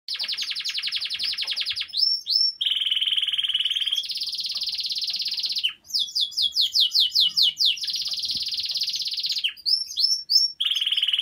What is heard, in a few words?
Canary, Bird Songs